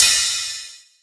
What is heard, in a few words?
Eucalyptus Ride, Dubstep Cymbals; One-Shots; Drums; Ghosthack; Ghosthack - AC2024 - Day 13 - Dubstep Sounds 2025